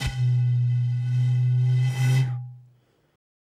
bottle, ethno, 125

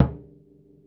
LOWDRUM

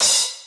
CHOKE, CYMBAL; drum

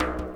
lick, ETHNIC STUFF

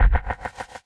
Perc, drums; One Shots; Percs; Touch Loops; BlissedOutBeats

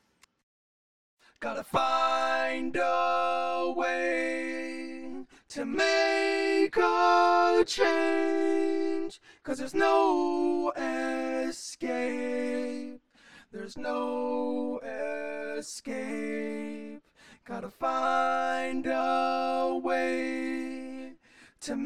Chorus Vox

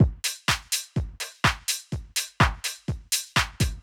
DEEPHOUSE BEATS 125

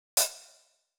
Hats, One Shots; Touch Loops; CosmicFunk

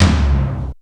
LOTOM

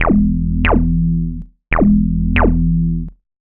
ELECTRO BASS 140 A

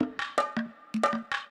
PERCLOOP ETHNO 160

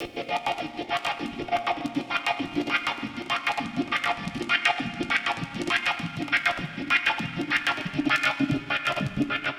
100 Gm Slow Funk, Spaced Out Guitars; Loops; Slow Funk Master; Touch Loops; CosmicFunk

